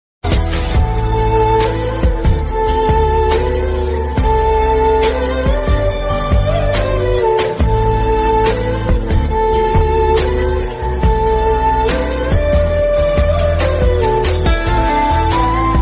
love bgm ringtone, sad bgm; malupu; shanmukh jashwanth

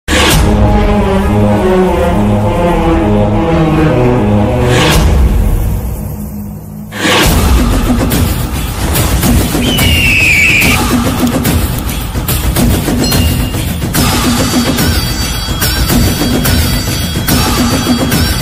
bgm

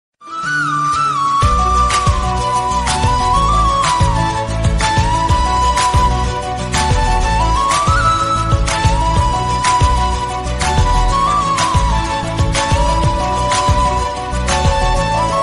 instrumental ringtone, bgm ringtone; rx 100

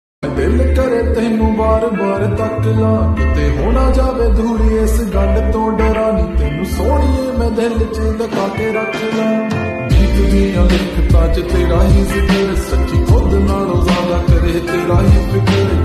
slowed reverb lofi songs

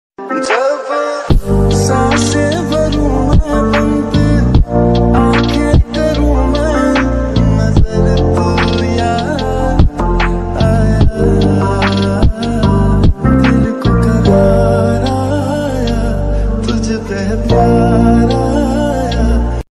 ringtone love romantic ringtone

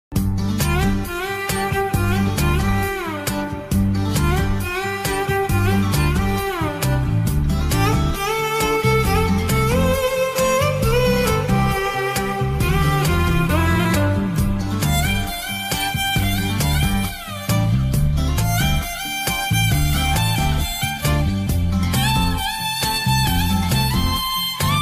musical version ringtone

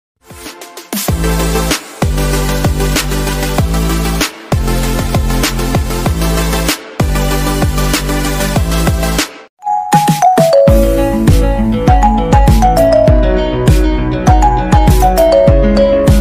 ringtone instrumental

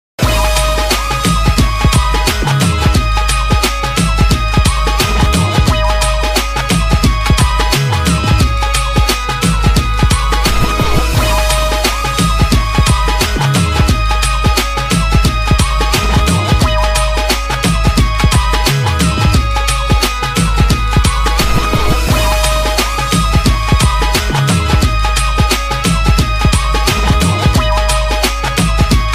instrumental ringtone

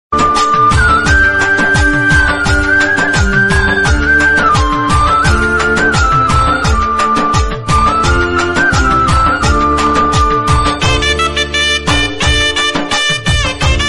ayyappa swamy bgm ringtone; manikanta

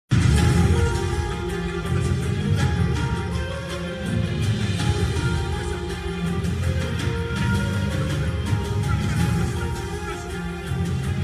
interval bgm mass bgm; Viswam movie bgm; Gopichand; Kavya Thapar

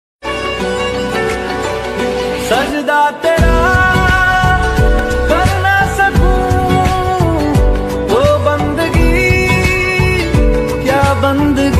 sad song

